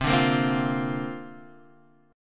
C 7th Suspended 2nd Suspended 4th C down Guitar Half Step Down